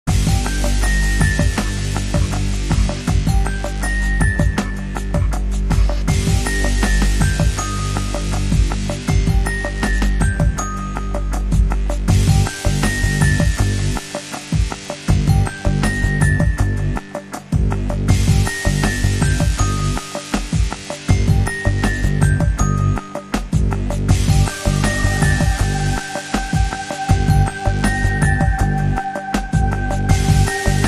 Bells Cry

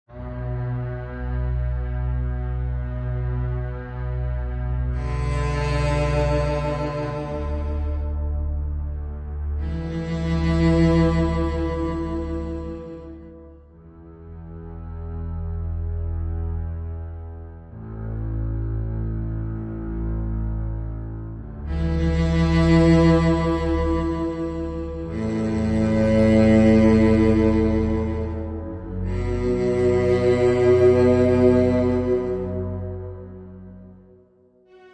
Strings

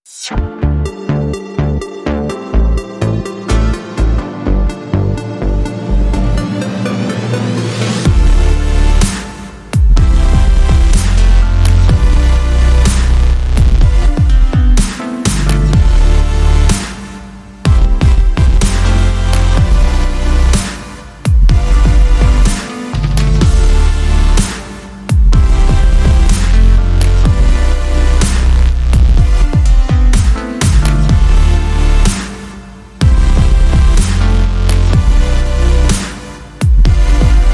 Dubstep Anthem, Underscore

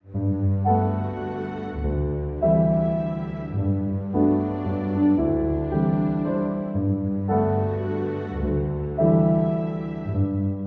With Strings

